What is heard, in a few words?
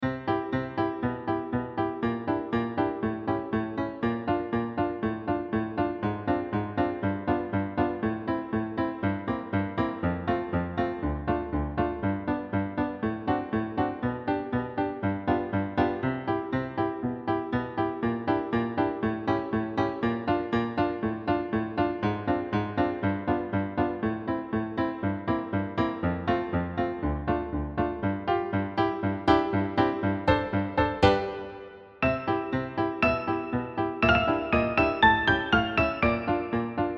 Piano